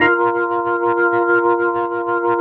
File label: ORGN 123, Keys; Houseworx